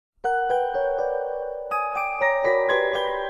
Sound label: Music Box; SailorMoon